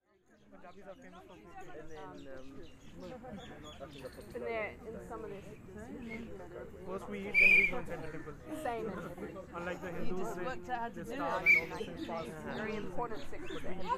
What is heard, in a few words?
picnic; Glitterbug; queerfest